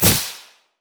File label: shadowswing; zed shadow